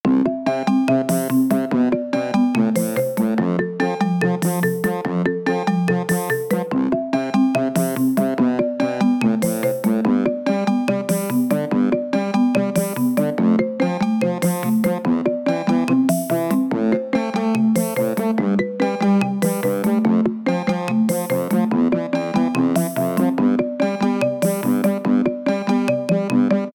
ios7 playtime, ringtones

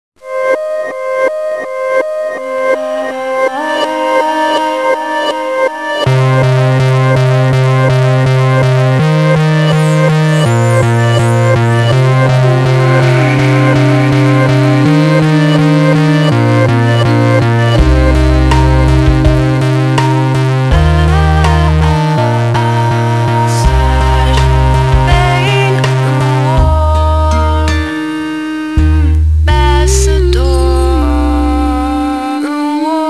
nokia theme, ringtones

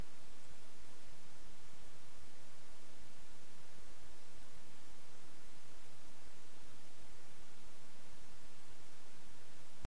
silent

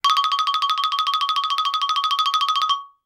trill, ringtones

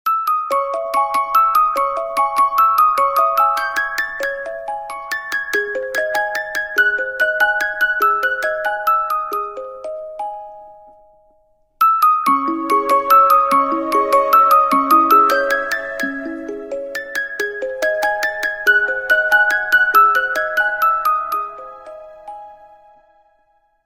nokia lumia 1520, ringtones

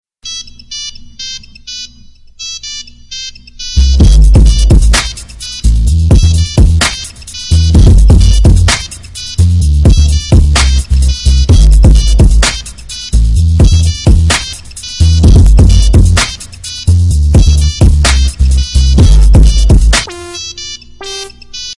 old nokia tune remixed, ringtones

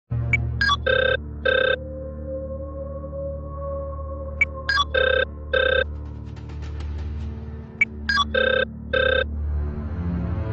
nokia n97 new ringtone

